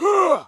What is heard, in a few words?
Scream